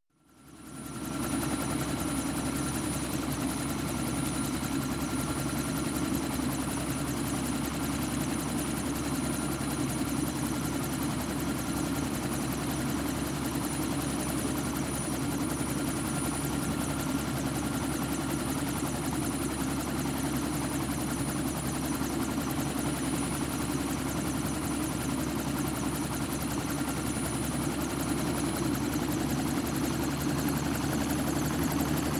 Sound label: Helicopter; Sound Ideas 6019